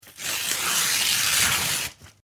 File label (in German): office papier zerreissen